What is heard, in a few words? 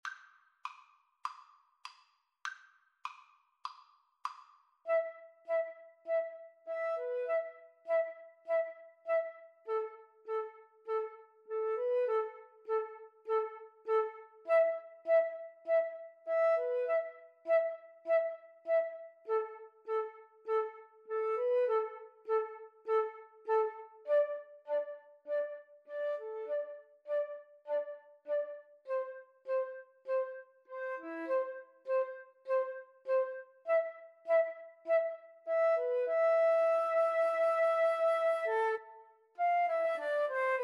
flute duet